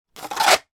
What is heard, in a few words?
toy sword plastic place in sheath; sound effects one